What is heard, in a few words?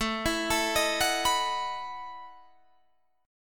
A 6th Suspended 2nd Sharp 11th, guitar